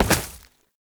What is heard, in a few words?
Step Vegetation, Foley; Warframe; Oraxia